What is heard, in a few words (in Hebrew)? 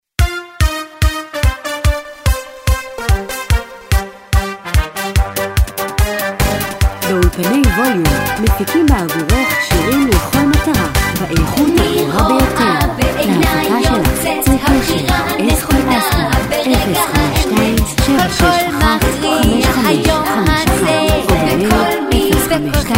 ניגון ברסלב; גינגל